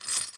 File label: run gold; lockjaw; the monster plane v2